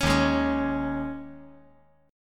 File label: Eb 7th Suspended 4th; Eb7sus4; strummed; JGuitar